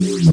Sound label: Mouse Button